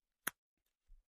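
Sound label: cell close, beeps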